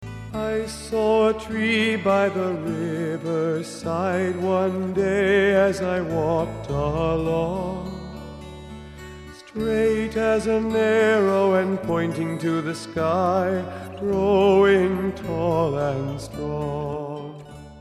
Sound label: tree song